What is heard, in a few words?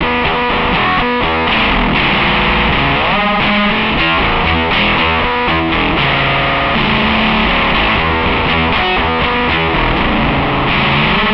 fazz and octave